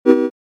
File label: c major 7; FSound